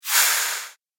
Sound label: Mage Spell Swish, magic sound effect; Role Playing Game; Andrew Fleming